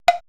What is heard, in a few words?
drum, 80s Drum Set, DRUMCOMPUTER